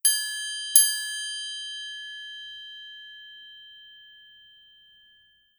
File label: Triangle